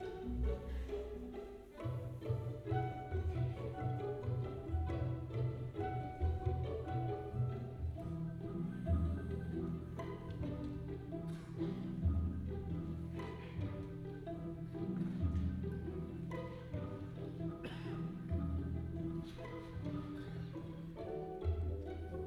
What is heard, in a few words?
Pizzicato; Lexikon-Roman